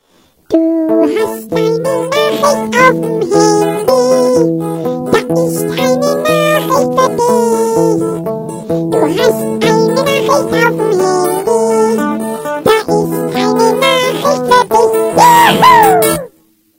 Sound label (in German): klingelton